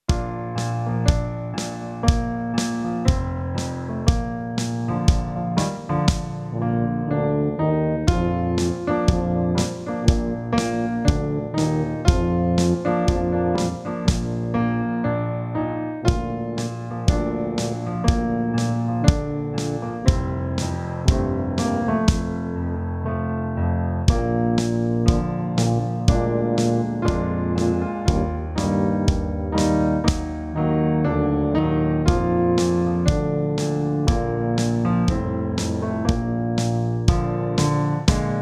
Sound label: bass tutti, brass; The Saints; backing tracks